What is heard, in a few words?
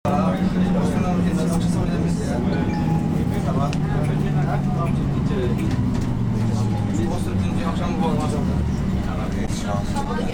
polyglot light rail soundscape